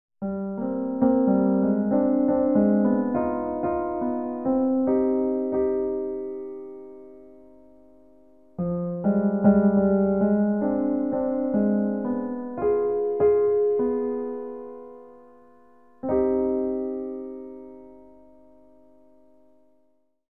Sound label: Solo Piano Moody